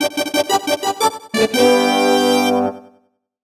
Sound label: Race Fanfare; Super Mario 64 restored